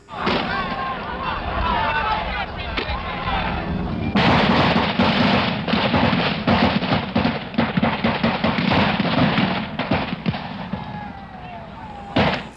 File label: shots